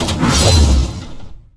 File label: melee stock; Tacz1.1.5+; RSWS 1903